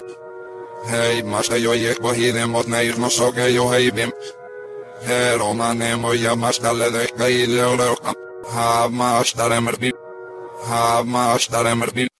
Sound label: arab mix